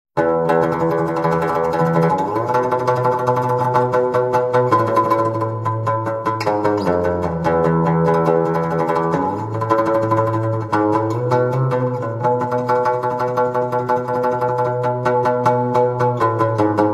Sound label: ORIENTAL DAWN